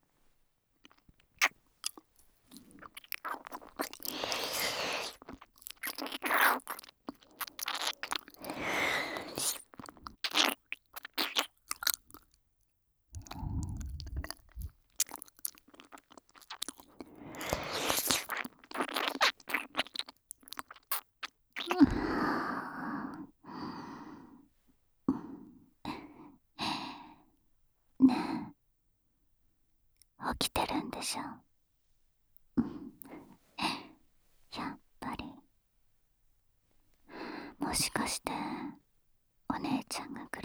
お布団の中でムレムレ本気交尾 with 耳舐め騎乗位

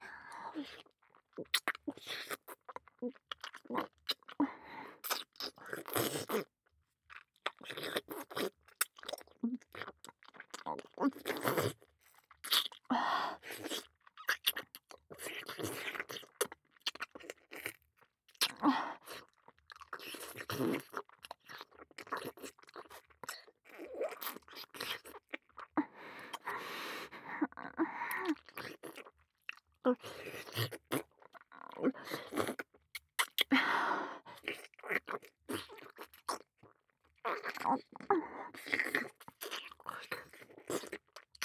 深めのキス, ASMR

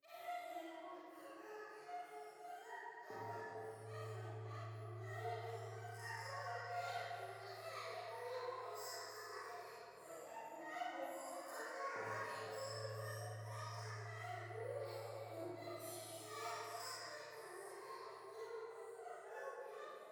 喘ぎ 神社; 夢の中

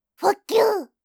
ポップアップウィンドウのブロック

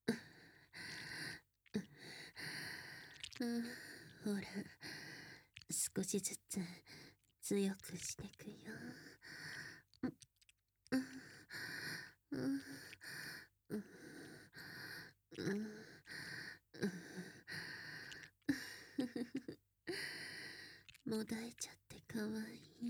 吐息+乳首弄りループ（少し激しく）

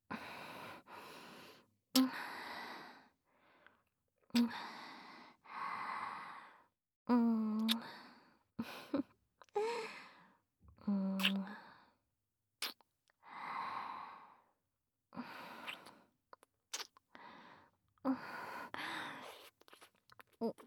キス; SEなし

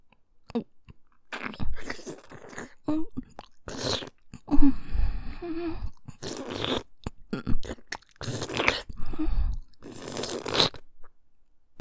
フェラ音(喘ぎ声をあげながら)

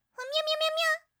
デバイスの接続の失敗

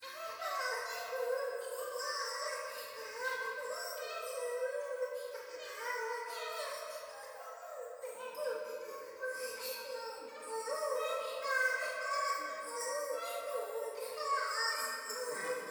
トイレ 可愛い喘ぎ

